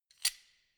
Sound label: wrench close soft quick; schrauben drehen hydraulik